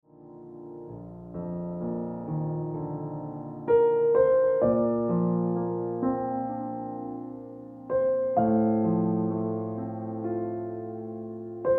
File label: Piano Music